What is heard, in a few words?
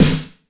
WET S909, WET SNARES; Masterbits - Soniq Elements